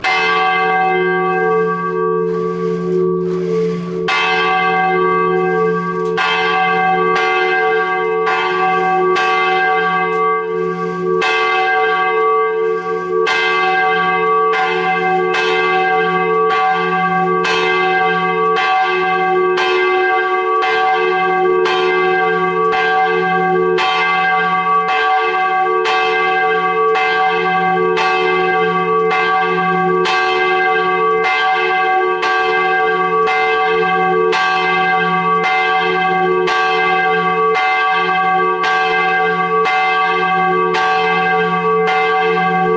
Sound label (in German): Kirche Glocke g1; St Lamberti; Hl Katharina